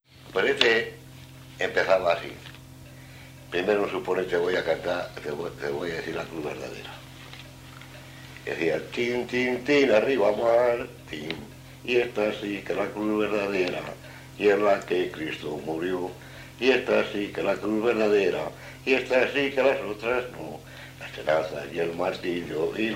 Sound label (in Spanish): Paloteos de Peñaflor de Hornija